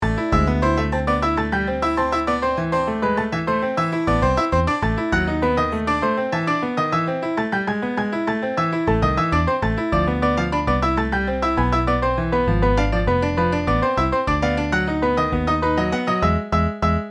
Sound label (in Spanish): Timba; Manana Club; Timba 3.0